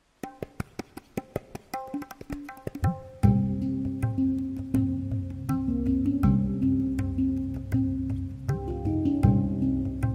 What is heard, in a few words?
TABLA HANG DRUM Positive Energy Music Rain Sounds; namasankirtana and chanting